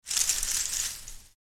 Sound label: Beaded door curtain sway rattle movement; blinds